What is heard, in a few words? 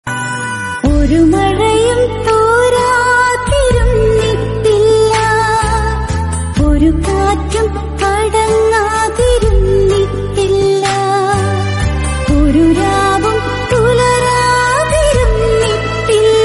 malayalam song